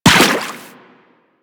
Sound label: Run Water, Footsteps; Warframe; Teshin